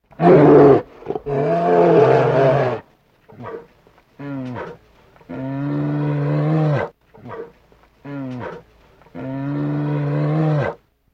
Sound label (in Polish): niedzwiedz